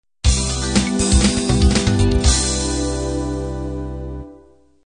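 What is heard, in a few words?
standard 16 beat end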